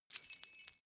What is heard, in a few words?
key ring large fly tinkle; objekte; schluessel